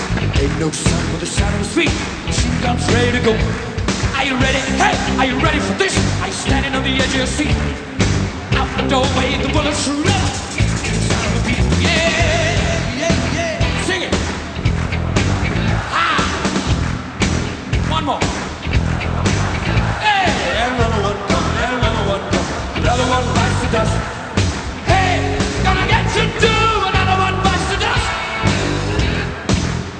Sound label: rock